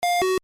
double bip

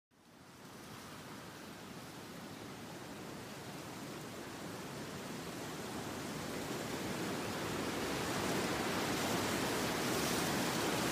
Wind Blowing Leaves Ambience

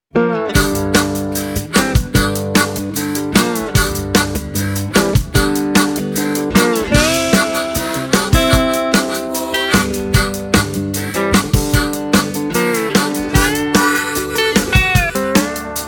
Countryside Summer Joyride

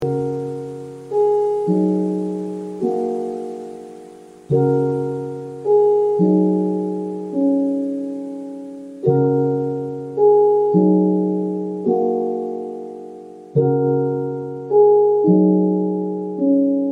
calm music; minecraft

